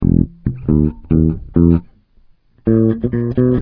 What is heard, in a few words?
dry bass